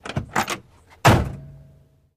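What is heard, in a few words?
download Sound, Chevrolet Corvair, Trunk ( Bonnet ) Lid Open and Close. Close Up.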